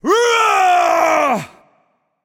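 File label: non verbal battlecry, voice; cernunnos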